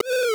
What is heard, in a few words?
drop; fpv